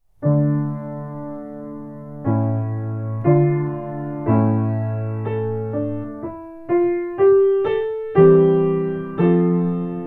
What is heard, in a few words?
pavane, Cembalo